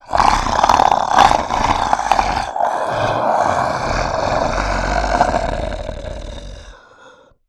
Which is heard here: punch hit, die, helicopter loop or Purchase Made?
die